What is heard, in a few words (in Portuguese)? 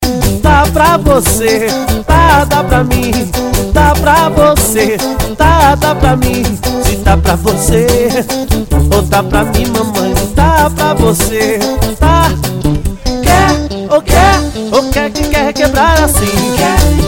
batida do gueto